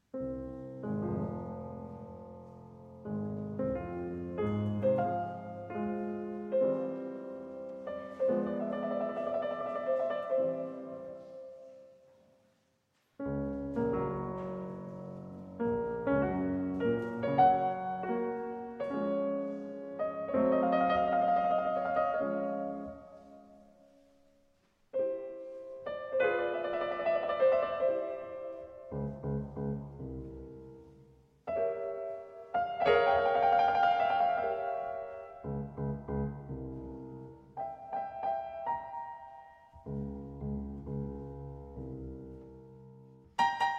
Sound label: Piano Sonata No. 23 in F Minor